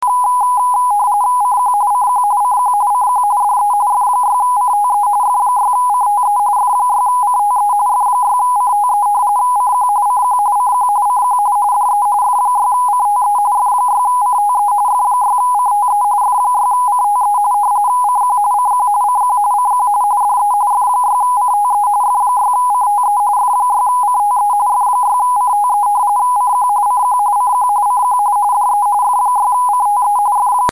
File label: rtty